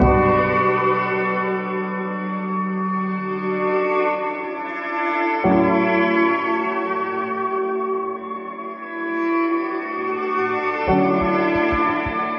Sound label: piano, sad loop